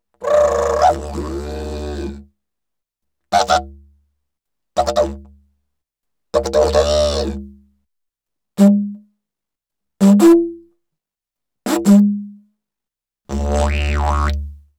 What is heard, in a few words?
Didgeridoo And Overtone; Good Stuff Audio